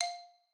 xylo

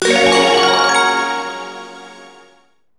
SE GAME SLIDE; maimai

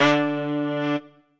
Trumpet melo

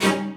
strings

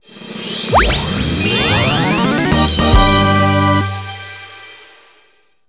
CARD PASS UP; maimai

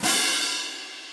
orch cymbal

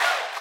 crowd

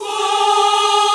choir

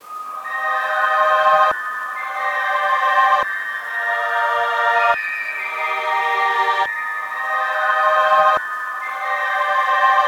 bgm

